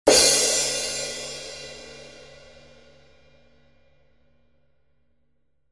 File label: crash